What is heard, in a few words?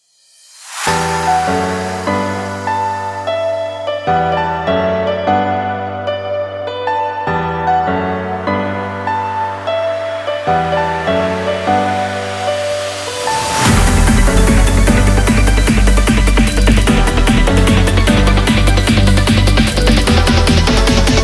drums & bass